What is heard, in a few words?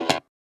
Guitar Amp Short Noise